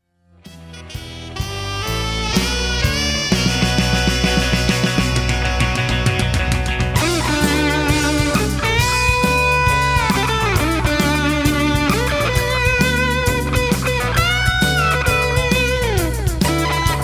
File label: MXR Carbon Copy